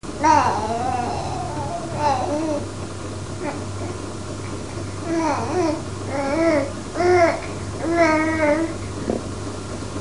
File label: squeek, baby; 5 mnths